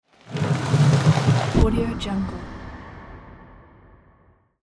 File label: opening grave